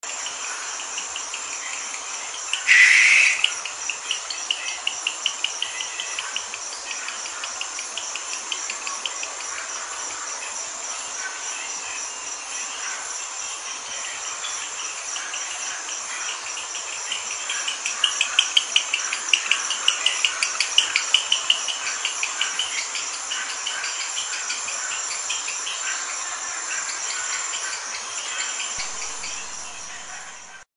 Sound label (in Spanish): Lechuza de Campanario, Tyto alba, Barn Owl; aves; cantos